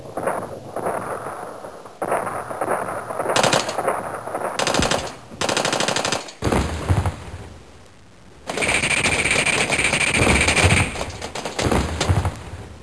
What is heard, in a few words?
War Sounds; Soundsation; Weapons